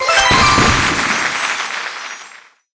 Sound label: chest level up